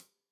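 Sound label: hat closed, hi-hat cymbals stereo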